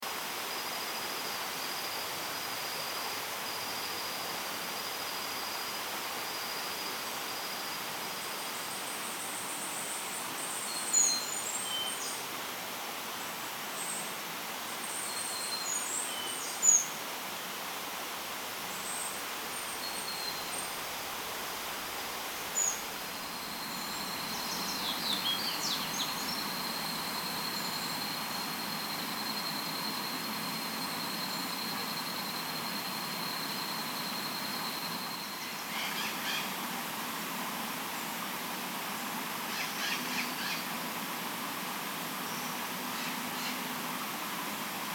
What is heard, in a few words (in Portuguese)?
SONS DA NATUREZA MATA DE GALERIA; JARDIM BOTANICO